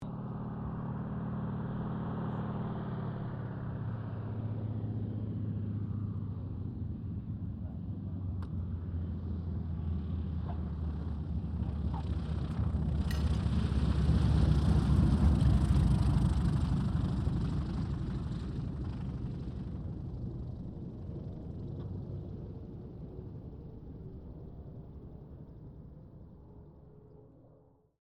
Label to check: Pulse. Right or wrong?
Wrong